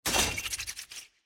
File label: Cable Fail; Puzzle